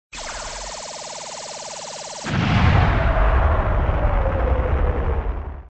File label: bomb